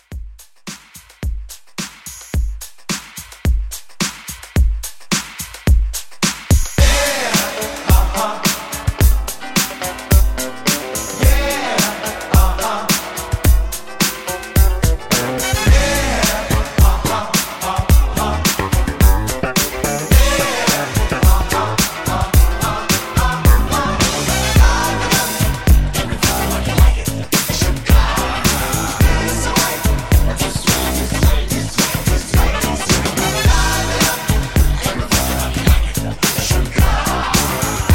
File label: 70s Funk Re Drum